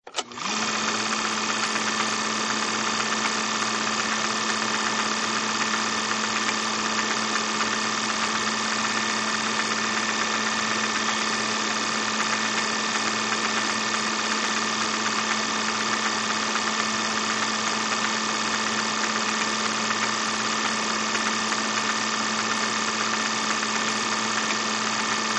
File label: Film Projector, Film projector turns on, runs, turns off; Projectors; Offices